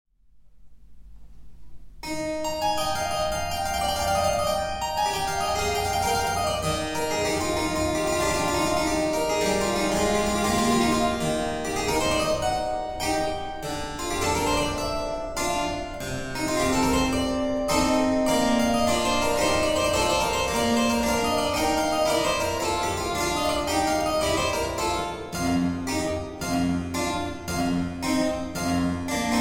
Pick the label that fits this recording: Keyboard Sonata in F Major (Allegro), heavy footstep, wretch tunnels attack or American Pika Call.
Keyboard Sonata in F Major (Allegro)